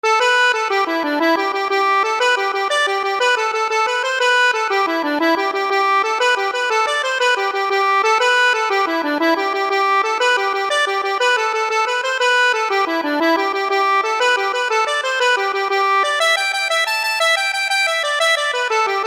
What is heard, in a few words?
accordion